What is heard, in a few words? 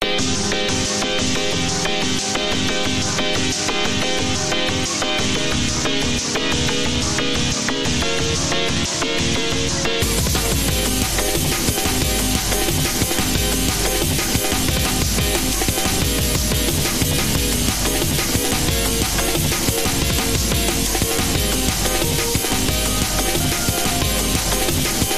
battle theme